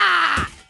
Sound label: die girl